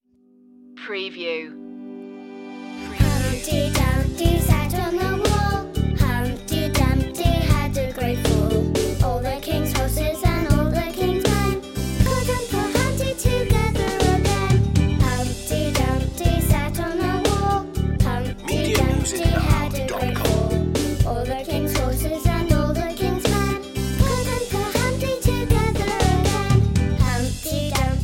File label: children vocals; humpty dumpty